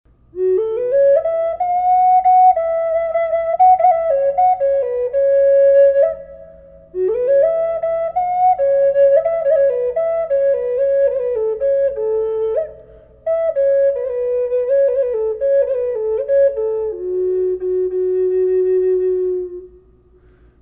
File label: reverb flute; california; bear; collector flutes